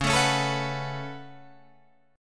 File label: Db 7th Suspended 4th Sharp 5th Db Guitar Open F; strummed